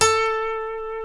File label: B, funk guitars; Spectrasonics; Hans Zimmer Guitars VOL-1; TAKA FUNK